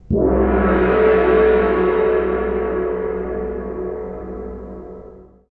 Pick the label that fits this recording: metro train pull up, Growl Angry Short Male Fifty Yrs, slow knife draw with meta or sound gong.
sound gong